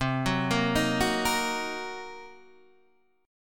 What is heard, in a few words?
C Minor 7th Flat 5th Guitar DADGAD, chord